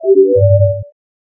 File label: points spent